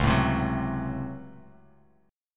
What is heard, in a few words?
Db Major 7th Suspended 4th Sharp 5th Db; 9,12,10,11; DbM7sus4#5 strummed; JGuitar